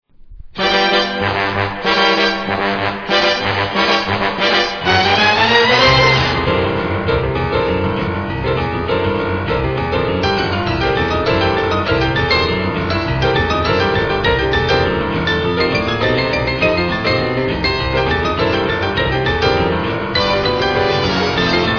jazz

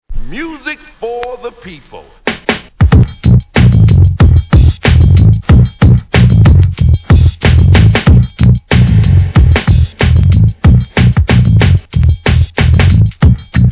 beats

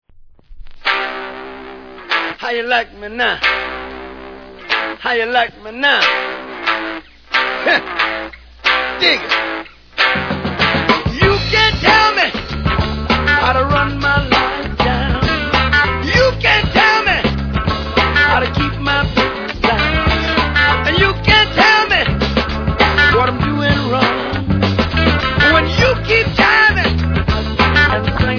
loud rock; disco

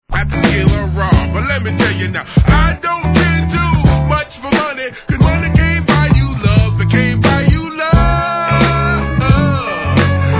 jazzy

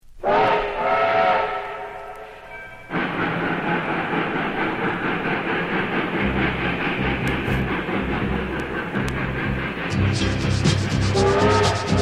harp

